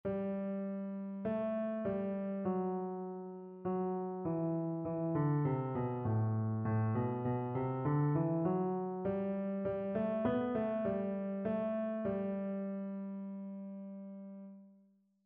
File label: melodic error detection